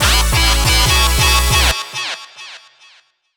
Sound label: dubstep club bass fill